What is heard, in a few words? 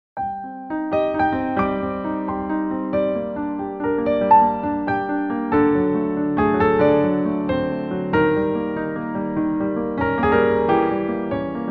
piano composition